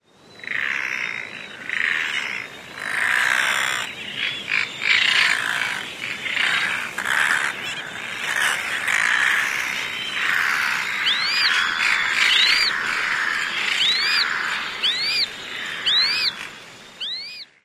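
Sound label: Brown Noddy grating and squeeks; DryTortugas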